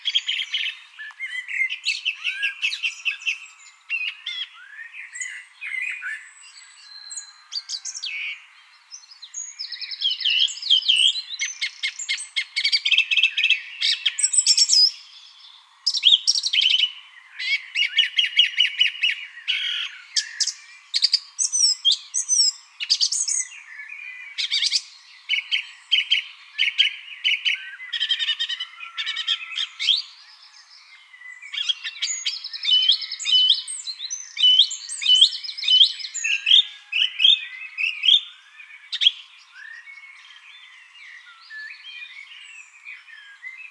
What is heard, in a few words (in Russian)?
pevchiy drozd turdus philomelos; peniya ptits